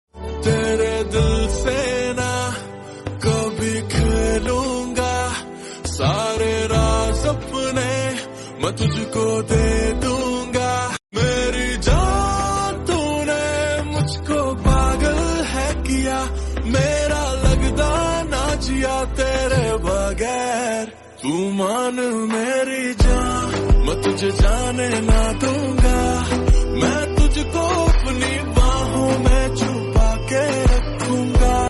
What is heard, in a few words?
Lofi Hindi song